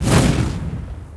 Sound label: ROCK